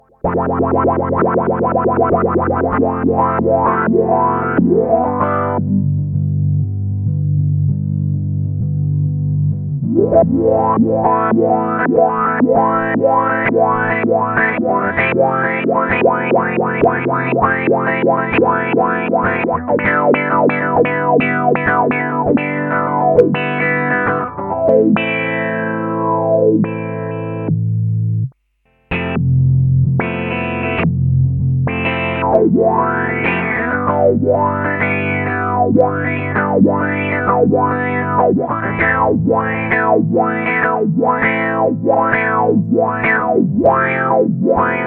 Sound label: Strumming Waves, lp bp hp lfo filter